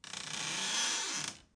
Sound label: door move